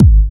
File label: Bassdrums; Vengeance Samples